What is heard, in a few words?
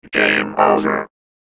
game over; PyGame; FailBoard